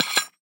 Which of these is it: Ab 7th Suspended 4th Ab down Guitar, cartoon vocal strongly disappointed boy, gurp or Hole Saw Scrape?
Hole Saw Scrape